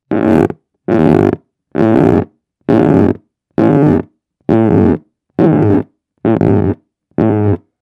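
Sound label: balloon sound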